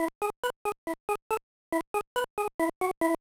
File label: Jingle, gba; backyard baseball 2002